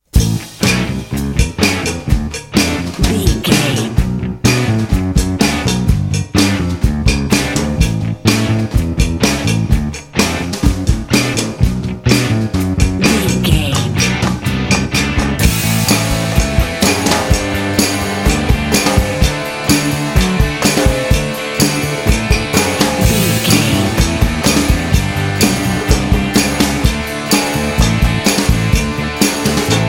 silly indie comedy hour bed

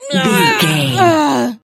voice female death

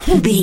voice male attack

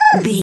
goblin fairy hurt pain

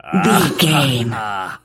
voice male laugh short

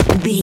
footstep dirt land

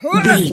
voice female attack

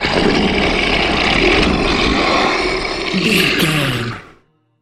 troll monster growl long

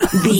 voice male cough short

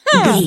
voice female expression emote

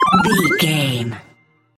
collect item sparkle pop

